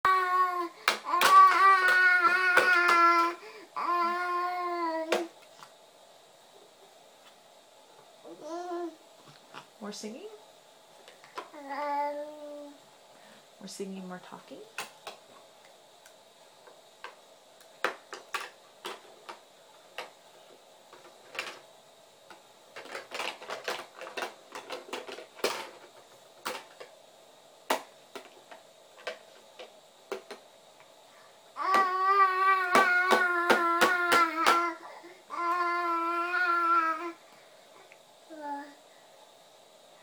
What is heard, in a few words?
at 10 months banging and making music